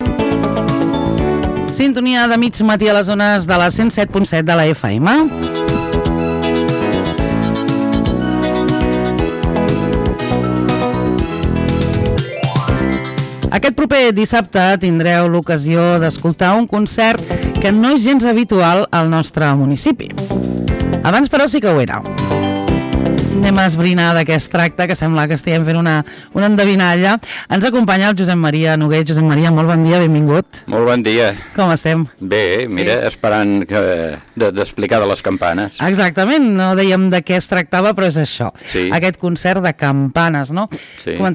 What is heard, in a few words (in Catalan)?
concert campanes